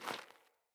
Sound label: Boot Walk Gravel, Footsteps; Characters; Enemy; Boots; Sinecure; Melee Grunt